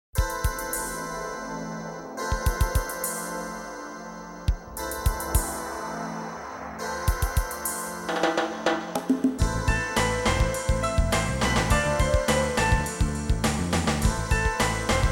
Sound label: Music Contemporary Theme